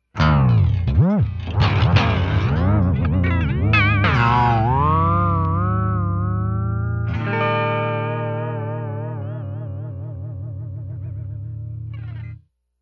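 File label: E guitar whammy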